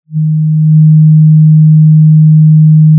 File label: pop, Sound of a cruise ship horn